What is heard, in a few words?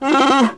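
Wookiee male hit; Star Wars Knights Of The Old Republic I; XBOX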